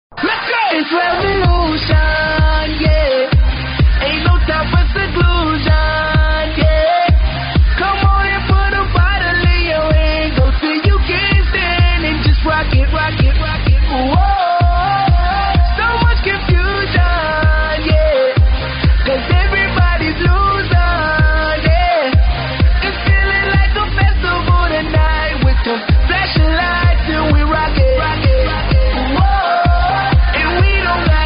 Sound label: funk hiphop